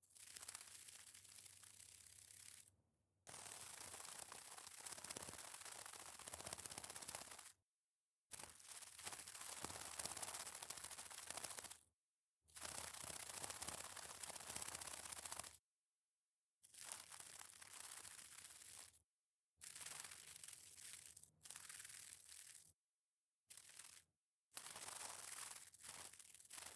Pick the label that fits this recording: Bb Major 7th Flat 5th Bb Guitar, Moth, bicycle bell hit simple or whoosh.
Moth